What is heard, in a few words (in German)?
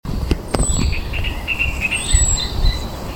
drosselrohrsänger